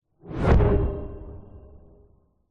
science fiction thud hit whoosh

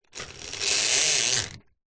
household blind shade pull cord close up; zapsplat

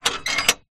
household gate bolt movement

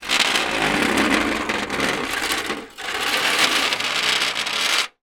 foley metal rod scrape on metal step ladder; zapsplat

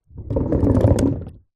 leisure rollerskate short roll wooden deck

